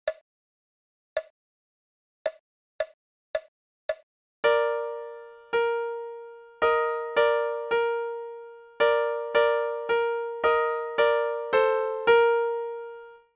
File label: Rain Rain Duet